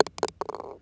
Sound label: ball hole slow, golf; ProStroke Golf World Tour 2007